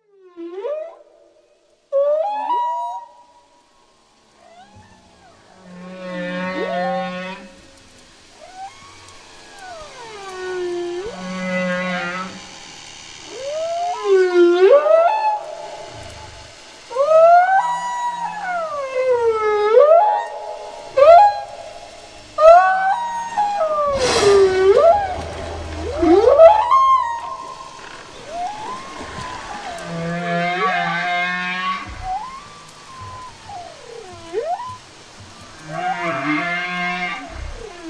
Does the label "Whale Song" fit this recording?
Yes